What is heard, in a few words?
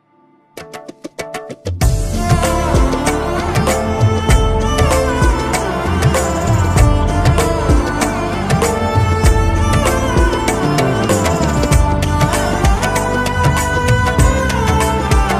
Flute Tabla Ringtone